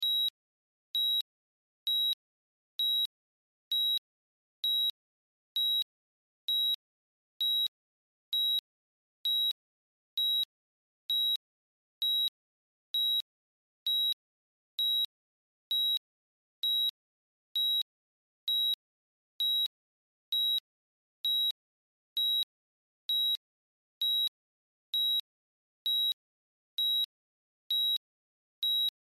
Heart Monitor, Heart Monitor 76 Bpm Beep Loop; Emergency; Heartbeat Sound Effects; Human

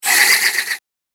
Rattling Whoosh Sound; Whoosh Sound Effects

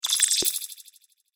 Fantasy Shimmer Magic Potion

